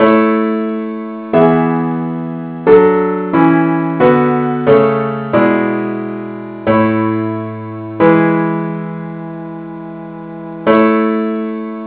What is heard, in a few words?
parts only key a